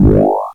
Drums, Hits; DCM8

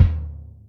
BD ersko, Yamaha DTXpress Acoustic Kick

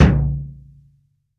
BD, REAL DRUMS, JUNGLE KIT

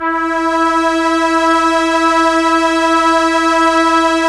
Strings Arp SE E6, ARP Ensemble; Keyboards of The 60's and 70's

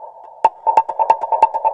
percussion loops, 138, Techno; PS-45 WAV Techno ID

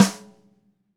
Ludwig Supraphonic Snare Room; Sonic Foundry (Sony Creative Software) - Vital Drums

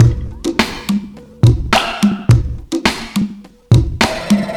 wobbly break, 105bpm; Zero-G; Freak Beats

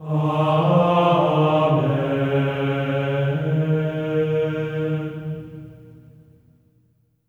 AMEN D#4, Symphony of Voices; Spectrasonics; GR AMEN

